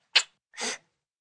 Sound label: Kiss Blow, Kisses, 1 child, blow kiss, human voice, kid; Kissing; VoiceBox